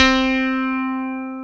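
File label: Elec C4, electric piano CP70; Best Service Gigapack 1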